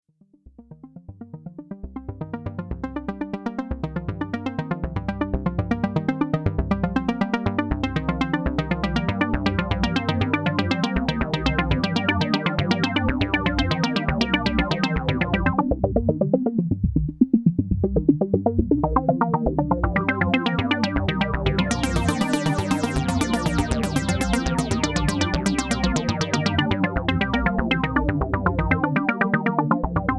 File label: Arpeggiated Prophet 08